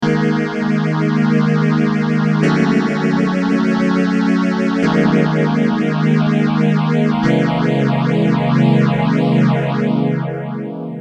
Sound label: Maestro PS1